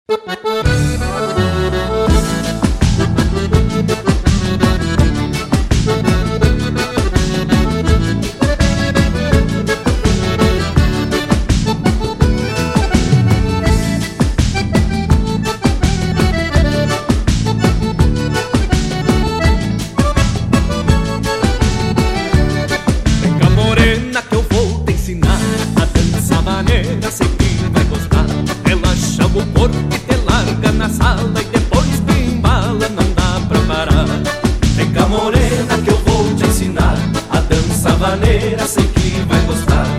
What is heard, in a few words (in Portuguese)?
batidão gaúcho sem vinheta